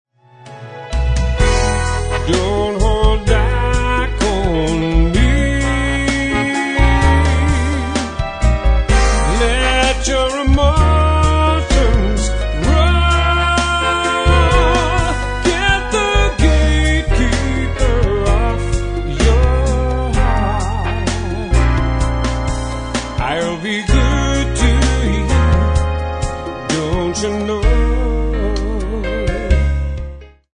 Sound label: Slow Dance